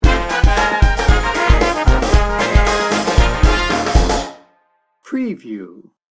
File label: mardi gras party sting bumper